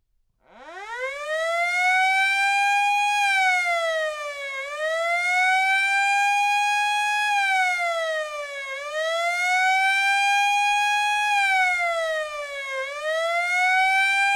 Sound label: Intermittent Siren Wavy; Important Message; JP Port